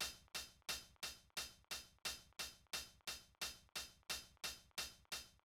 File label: hihat